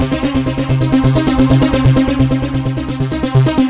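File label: dramatic synth arpeggio, 130 bpm; SYNTH AND ELECTRONIC LOOPS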